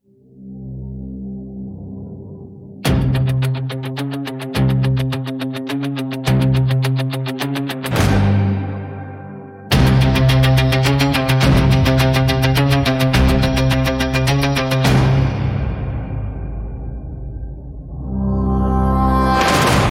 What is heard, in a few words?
mbira; honeybadger